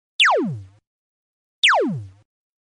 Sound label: laser gun notification